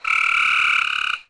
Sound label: animal; DAoC; Toa